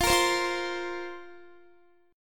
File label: F Suspended 4th F, Guitar; Fsus4; strummed; x,20,20,17,17,19